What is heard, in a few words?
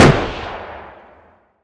heavy gunshot; fight